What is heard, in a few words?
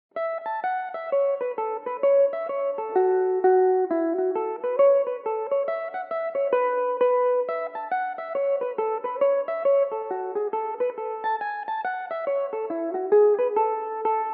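A major, Irish mandolin; rosie rogers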